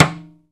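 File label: Timbales closed rim; E-MU Producer Series Vol. 2 – More Studio Essentials; Composer; Timbales Xswitch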